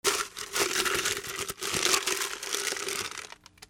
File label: OFFICE